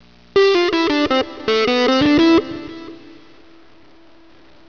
elec guitar

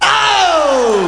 DICE CLAY

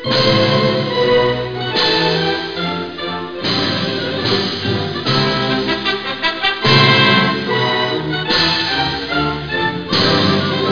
orch

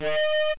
noise

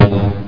EXPL; PCMania; biobomb

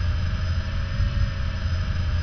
hovers; machines

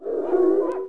wolf default

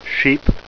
SHEEP

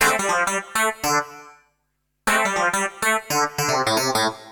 Synt; FUNKACID5

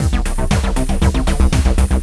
Red Drum; Macworld (1999-03); Games World; Hot New Games; Cloudz 1.0.0

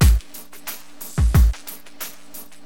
DM LOOP, Hip Hop, Drums; LiveAct Pool 1

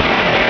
flame; Robotron; PC Gamer 39

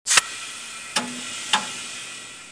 fill tire, street

